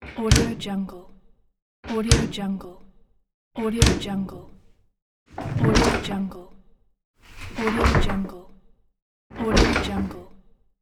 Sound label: fridge door